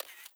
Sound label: camera click repeat; Sharp AQUOS sense4 lite SH-RM15; ui